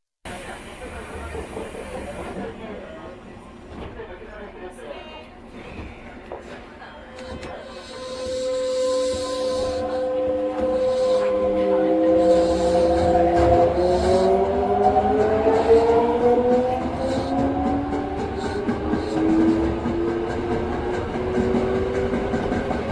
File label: vvvf, mitsubishi; toei 5312